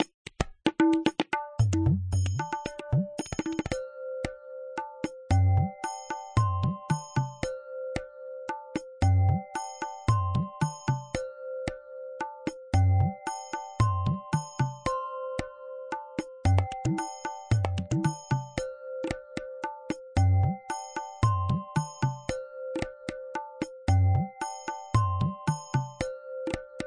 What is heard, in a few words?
rupak, groove